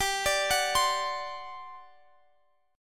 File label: G Major 7th Suspended 4th; GM7sus4; arpeggiated; x,x,17,19,19,20